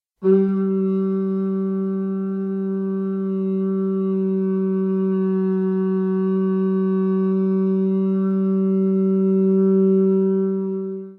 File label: Newgrange Singing